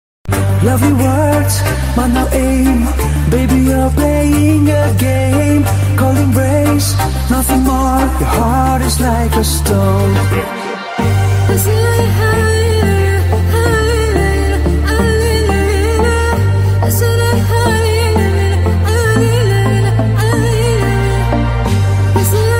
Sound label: Hollywood Song Ringtone